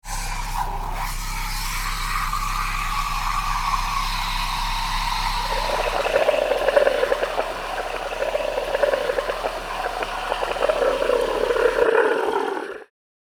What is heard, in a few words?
Milk frother milk bubbling; appliances